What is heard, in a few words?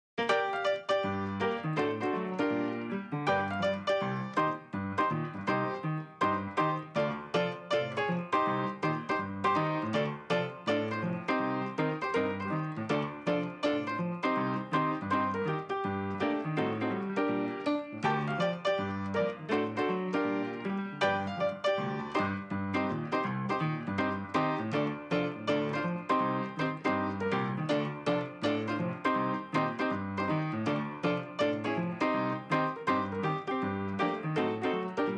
saloon piano; original score